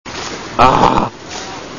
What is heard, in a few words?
aaaah